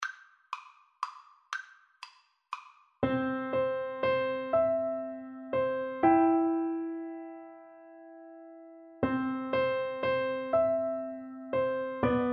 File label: piano duet